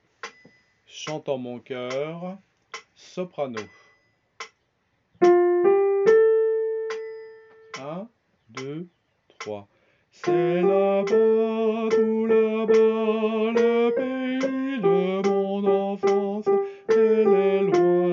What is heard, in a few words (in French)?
chante en mon cœur soprano